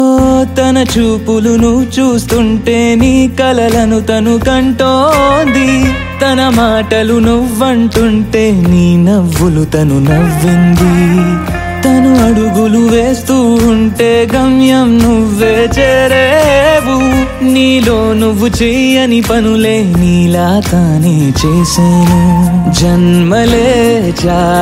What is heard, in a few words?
telugu new song ringtone